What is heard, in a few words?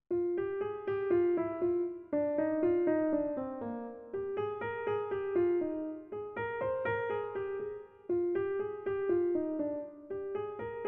motives; 08 - F Minor 2/4